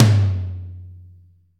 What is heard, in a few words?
TOM, Drum Dry; East Collexion; Drum 1